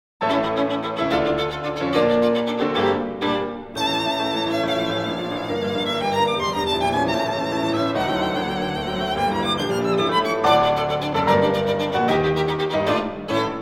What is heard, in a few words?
Violin Sonata No. 3 in D Minor, Presto Agitato; Brahms; Op. 108